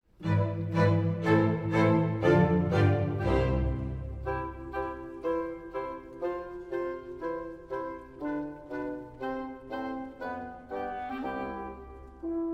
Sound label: piano